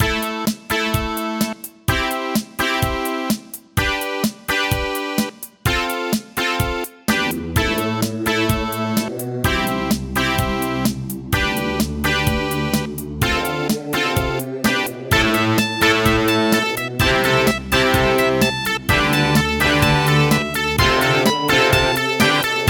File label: Wave 1, Casio CTK-800; Raptor